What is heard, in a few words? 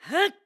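Jump Attack, voice; Link; BOTW; Breath of the Wild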